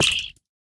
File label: pufferfish hurt; minecraft